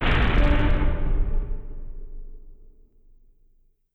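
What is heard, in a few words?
Collision Interior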